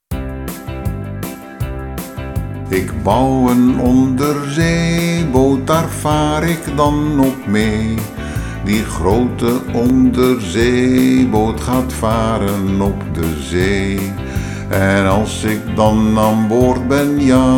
Onderzeeboot zang en muziek; Bieb Almere

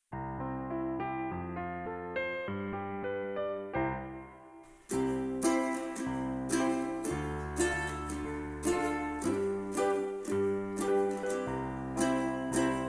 instrumentaal; Bieb Almere

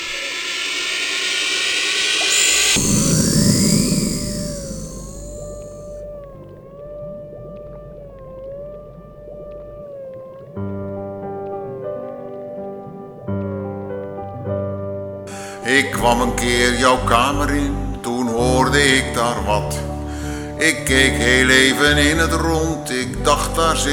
Het spookje muziek met zang; Nijntje; Bieb Almere

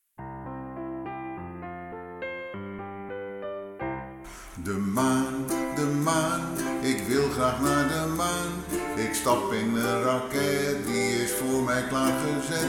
Raket naar de maan zang; Bieb Almere

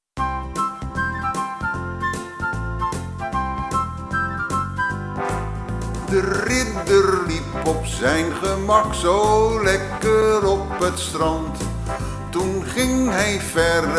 met zang; Bieb Almere

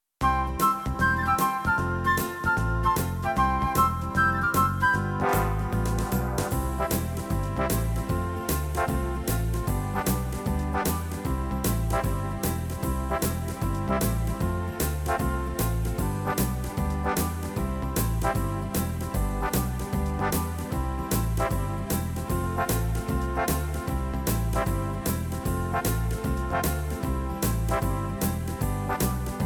muziek zonder zang; Bieb Almere

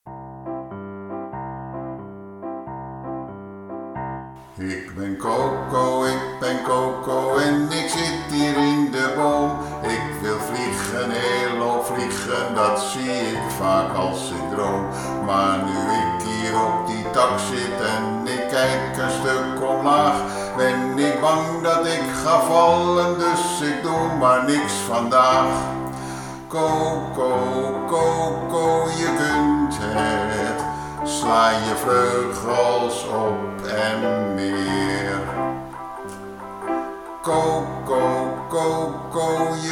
Muziek en zang; Bieb Almere

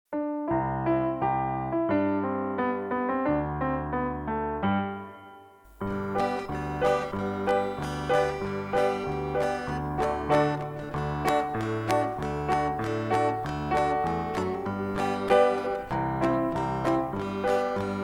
muziek zonder zang; Boer Boris; Bieb Almere

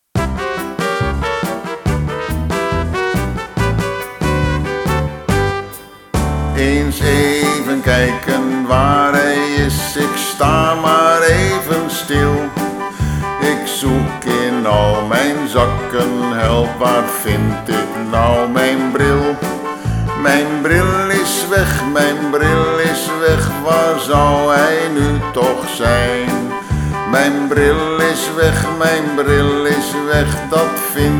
muziek en zang; Beren; Bieb Almere

